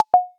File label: error